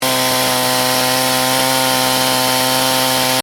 Chainsaw: petrol chainsaw engine steady at high revs; Machinery; Machinery Sound Effects; Stuart Duffield